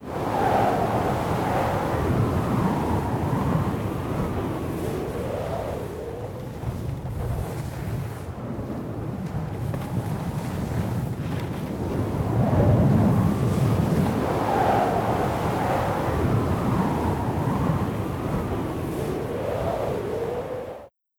Hoover dam Whistling

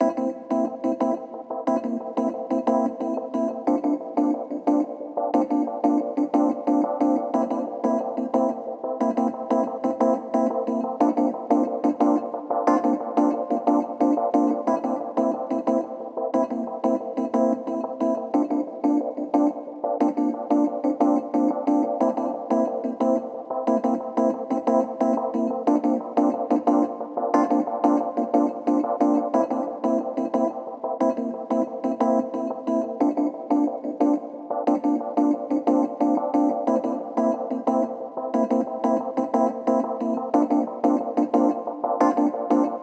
Cherrapunji Keys 90

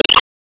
Bounce Ball; gamerip

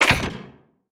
Box Open

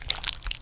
Octupus; Picnicboat; Neighbours From Hell Console Edition; GC, Xbox

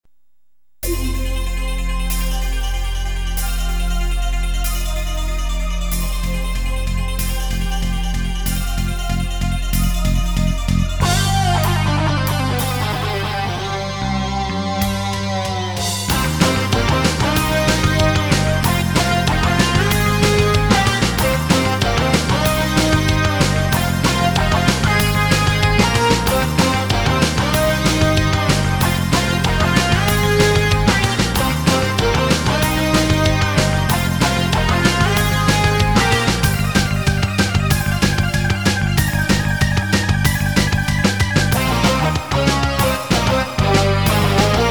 Scissors Shrimper's Stage

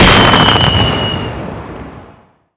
Barrel Explode; Barrel SFX Explode 01

